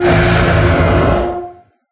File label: Monster; Life of a Naomi